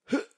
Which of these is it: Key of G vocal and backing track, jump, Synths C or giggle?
jump